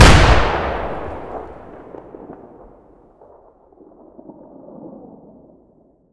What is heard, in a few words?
grenade m397a1 boom; STALKER; IWP